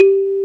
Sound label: THUMB piano G3; AKAI S6000 CD-ROM - Volume 1; MALLETS